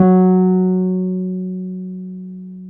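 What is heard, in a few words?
piano Wurly; PNO; Roland LCDP10 Keys of the 60s and 70s 2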